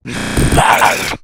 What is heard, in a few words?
zombie attack; custom monsters; glitchzombie